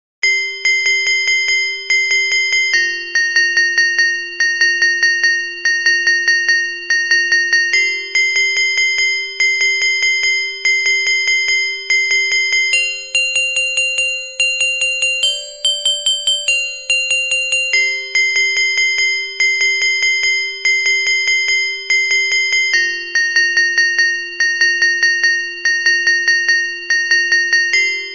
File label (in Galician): Xota Metalofono Contralto